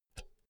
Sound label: pull out cinch soft; objekte; elektronik